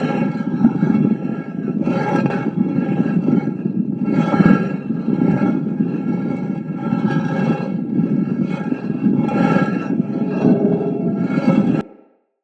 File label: Ruins Machine Loop; Props